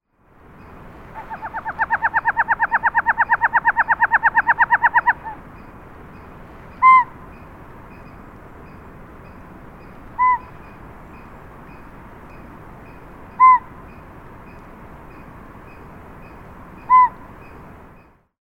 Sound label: Northern Pygmy Owl Sounds; All About Birds Cornell Lab of Ornithology